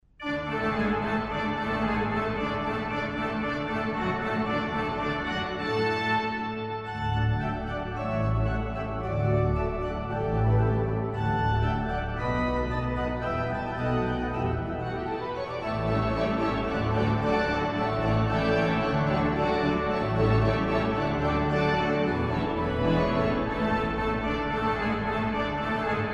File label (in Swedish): Militarmarsch; Julskiva Hedvig Eleonora